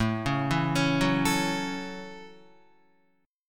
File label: A 6th, Guitar; arpeggiated